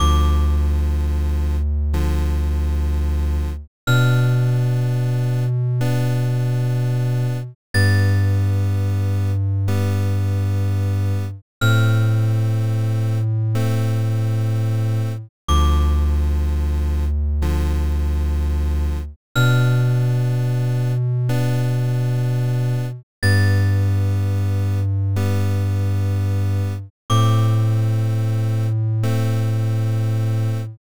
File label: bgm